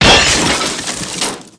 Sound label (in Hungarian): karambol; Pure Pinball